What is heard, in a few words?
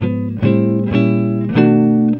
EGUIT ARPEGG; Best Service; World Colours